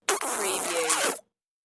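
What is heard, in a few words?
Funny Kiss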